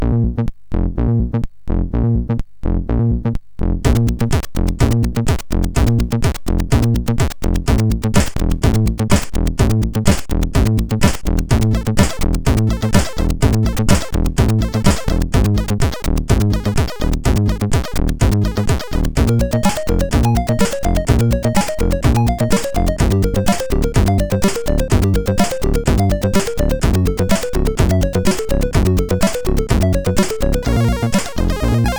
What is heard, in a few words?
game over plus level, sid MOS6581R4